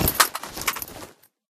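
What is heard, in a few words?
inventory ruck unload weapon; STALKER; IWP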